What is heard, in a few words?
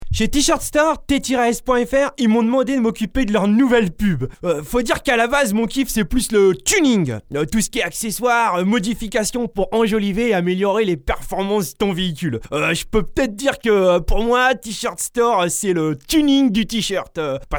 Tuning